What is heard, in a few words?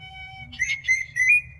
Twrrl Beep Beep Beepeep